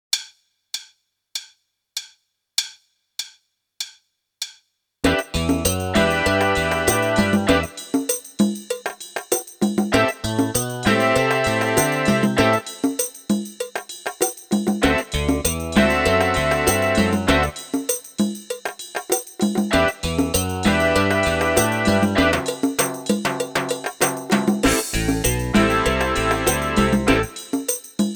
Snare Bass Drum Kombinationen; Meespeel CD

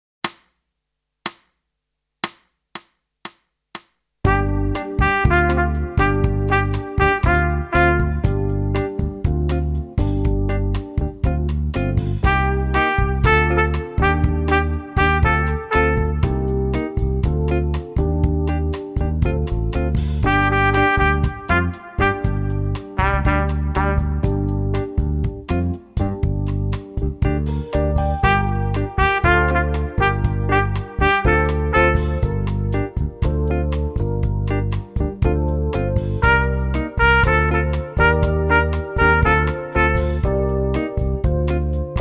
Echo Ubung

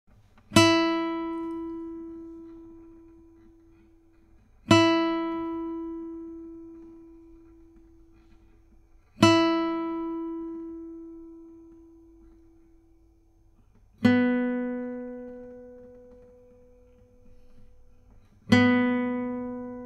Stimmtone; Meespeel CD

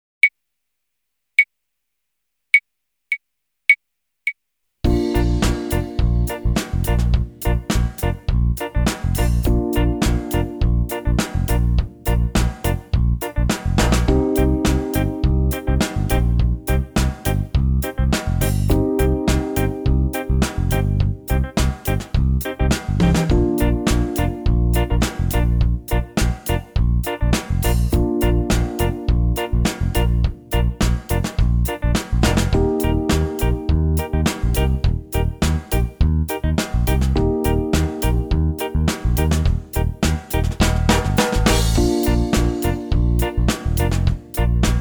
Pausen Reggae

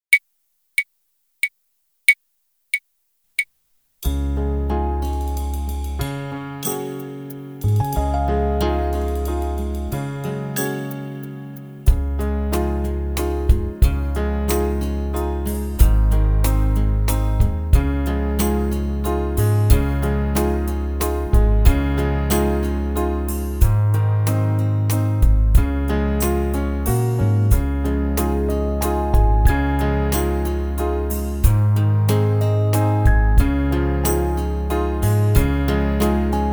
Melodie

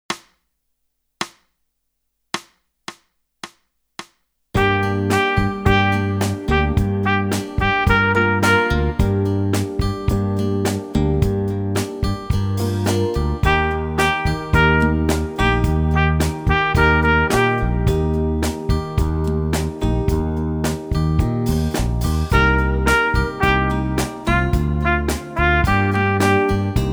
Echo Ubung

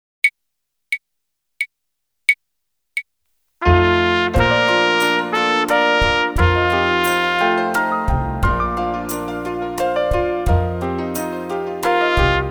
Echo Ubung

